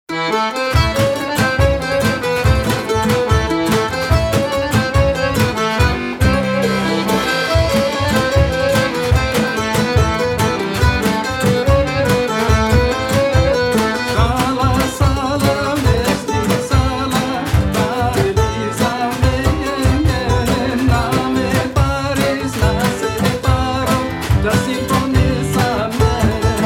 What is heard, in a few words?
greek quartet